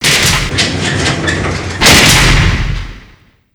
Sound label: armory door open; gulag; Call of Duty Modern Warfare